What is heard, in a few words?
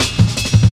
Samurai Cut, Drum Loops, 165.5, Jungle; Zero-G Jungle Warfare 1, 2 & 3